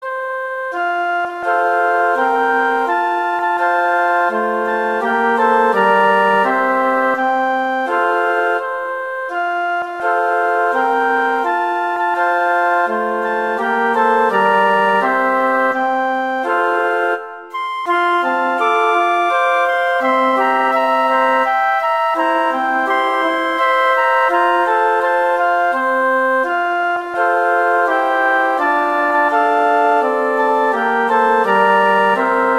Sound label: O Christmas Tree, Christmas Flute